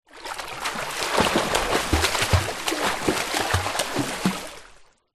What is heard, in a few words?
sport child swim in pool short